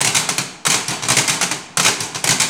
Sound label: TESLA, Science Fiction